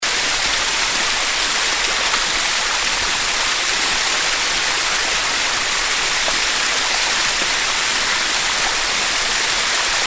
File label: Carpenters Falls